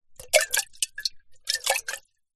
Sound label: household teapot ceramic tea inside movement splosh around lid off